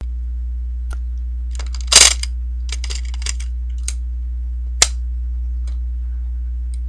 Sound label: Cassete Player Open And Take Out Tape